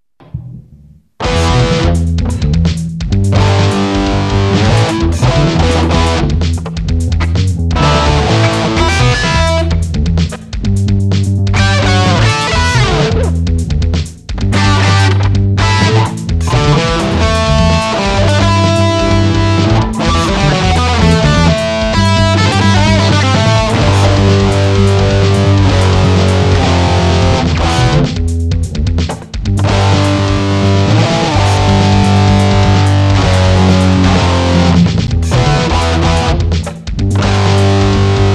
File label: guitar